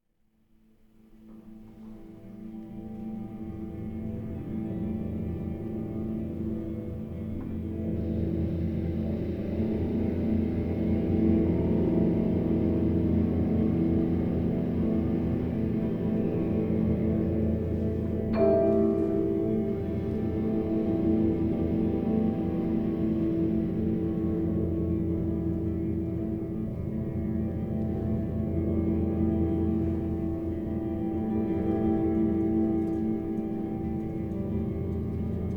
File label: Soundscapes